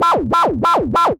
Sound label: ALARM